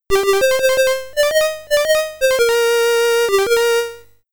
Jingle When Johnny Comes Marching Home Again; Head over Heels; commodore 64

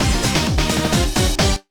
Jingle Fanfare; Monster Racers; ds

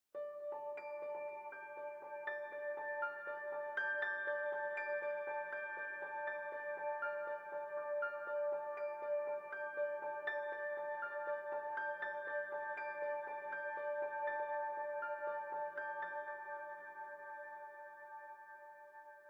Citadel Piano; Frozen Flame; windows

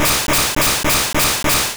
Dig Start; sfx; attack moves; pokemon; gen 2; gsc

